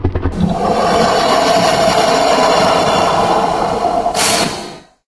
hydra alert; hl2

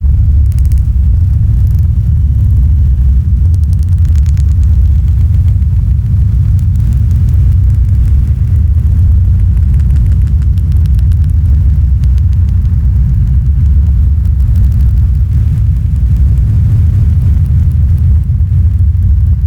Designed Fire - Textures - Rumbling, glitch

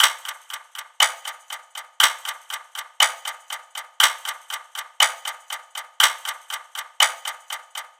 Clock, Effective Trailer Clocks; Federico Soler Fernández

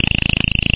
confirm move; hl2

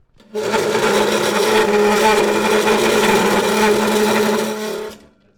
Desk, Move, Scrape, Fast; UberDuo; The School Audio Playset

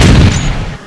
air turret fire, vehicles; hl2

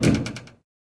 locked rattle, door; hl2; func door

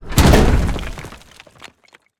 demolisher debris rubble texture; Robot; BluezoneCorp

